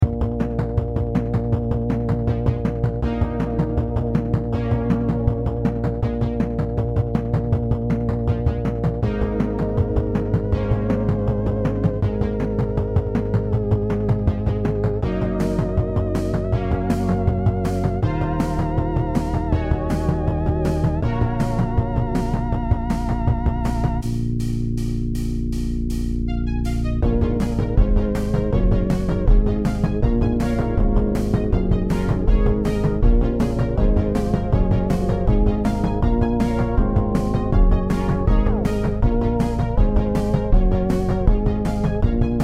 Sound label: Soundtrack; rund um die welten